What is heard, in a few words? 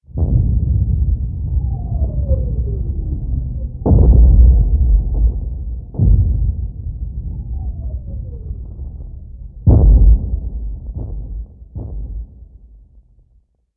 Amb Destruction; Red Orchestra Ostfront 41-45